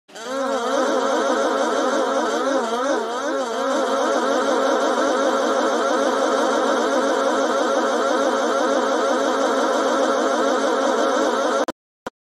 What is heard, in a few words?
multi uh uhhhh